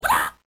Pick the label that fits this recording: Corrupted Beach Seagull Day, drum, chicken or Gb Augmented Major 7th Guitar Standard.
chicken